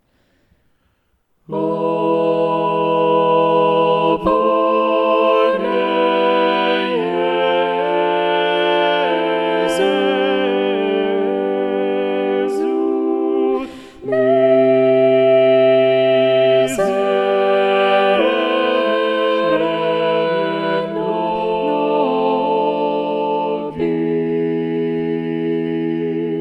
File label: SATB Balanced Voices; O bone Jesu; Marco Antonio Ingegneri